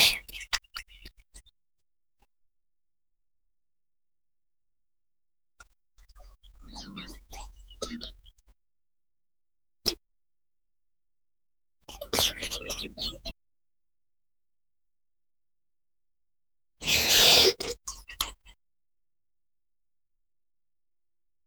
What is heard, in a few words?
goblins kissing